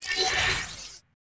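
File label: ui transition; Quantum Redshift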